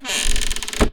creaky door close; everness

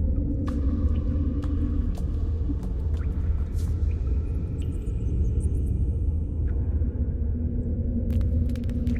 ambience cave; everness

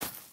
grass hit; everness

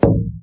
glass footstep; everness

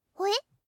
eeuh

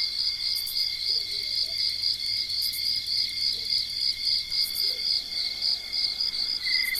ambience night crickets; everness

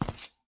ceramic footstep; everness

